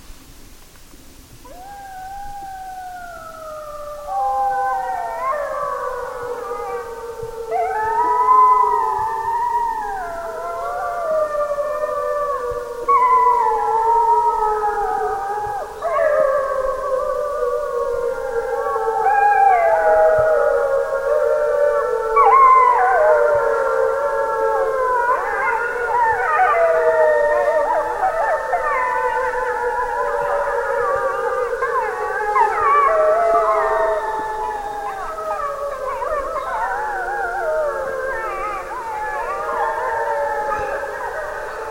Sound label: wolf pack howling; animals